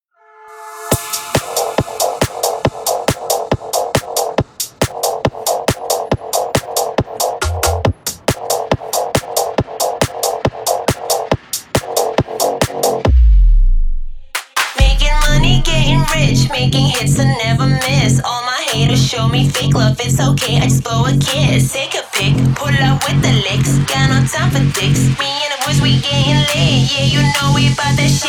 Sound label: kawaii 808